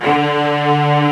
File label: LO ST STRING